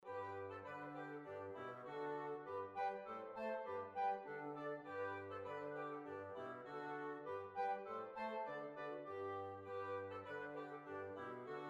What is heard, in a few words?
German Spa